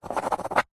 office pencil signing signature on back of pad of paper